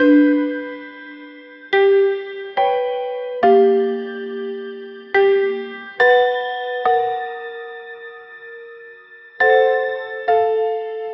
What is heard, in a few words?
create a cute piano jingl